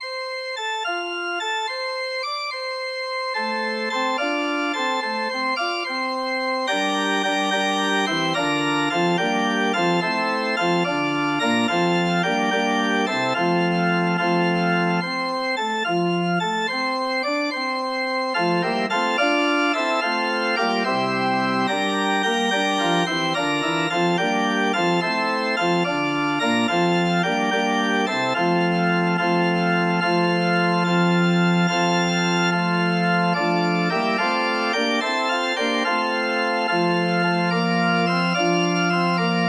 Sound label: christ was born, organ